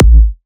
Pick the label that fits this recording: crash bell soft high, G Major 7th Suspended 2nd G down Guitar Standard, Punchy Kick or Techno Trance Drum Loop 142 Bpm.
Punchy Kick